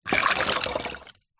household water pour into plastic funnel

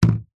food apple set down on wood table

